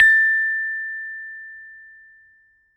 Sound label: Saron P, Gamelan